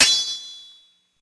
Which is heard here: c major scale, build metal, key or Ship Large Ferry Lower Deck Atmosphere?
build metal